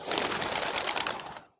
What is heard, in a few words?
pigeon take off